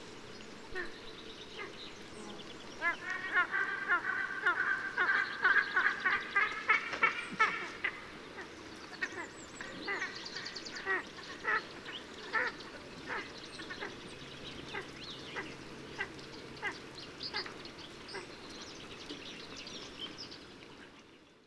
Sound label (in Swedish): Spansk kejsarorn